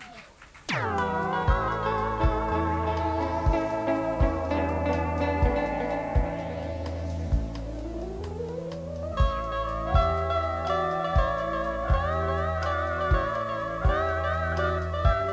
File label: Drums; Haarlem